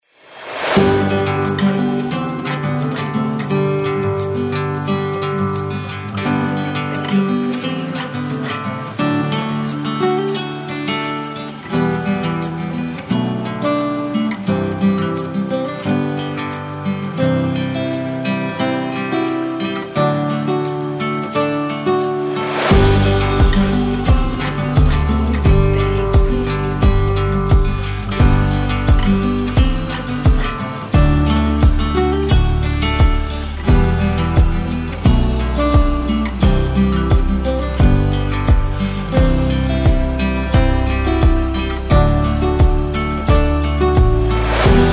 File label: Background Folk Acoustic Guitar